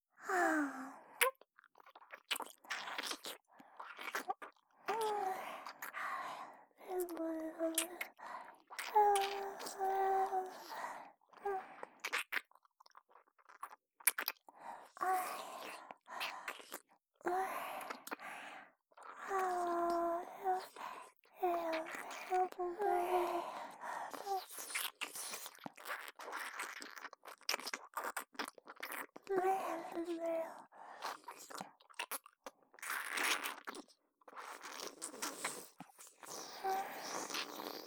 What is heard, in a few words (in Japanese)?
ふたりで耳舐め